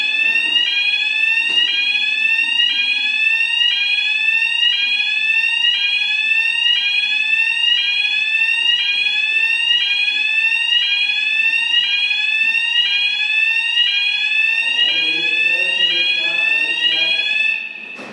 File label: Fire Alarm; Abbey Centre